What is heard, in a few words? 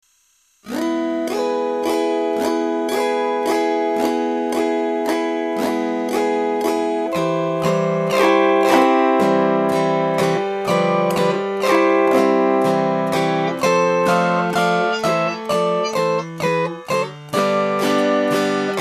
Waltz